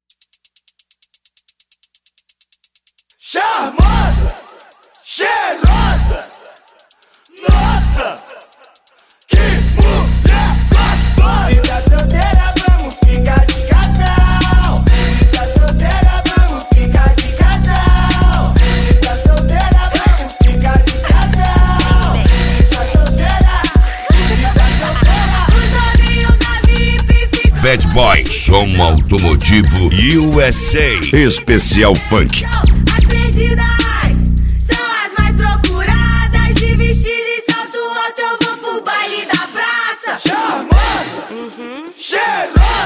especial funk; cd bad boy som automotivo usa

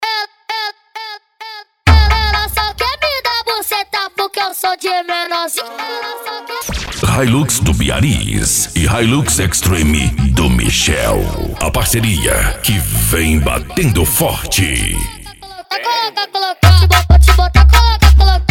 funk mega bass

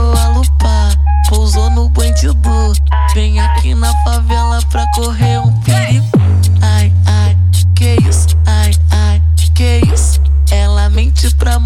funk bass

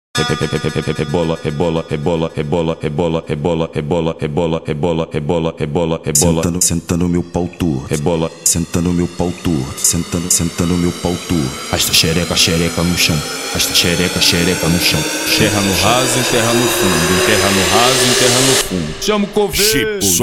especial funk

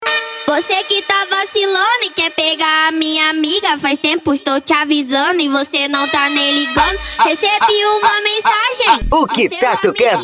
funk mega bass

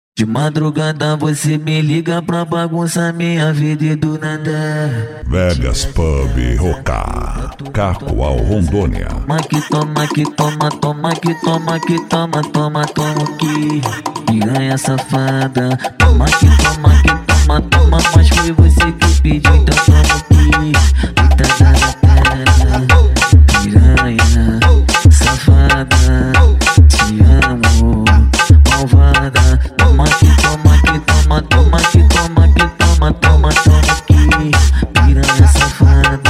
funk mega bass

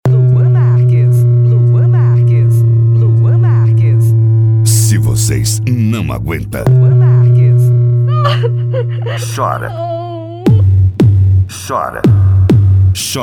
extreme bass; carreta

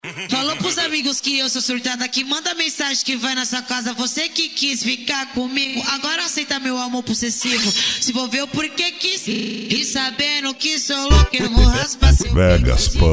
funk mega bass

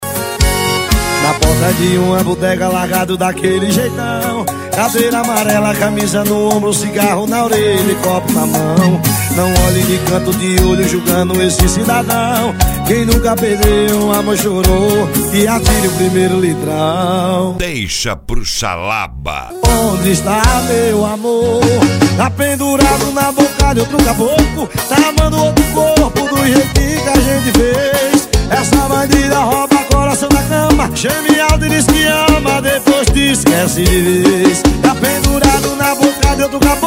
sound car; pizeiro; pisadinha

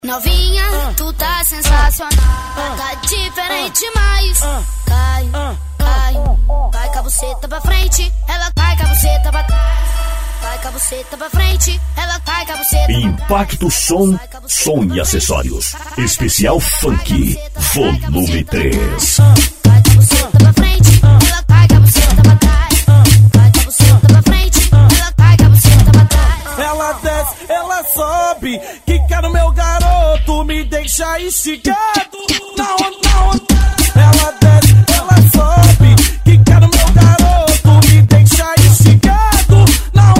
funk bass; impacto som

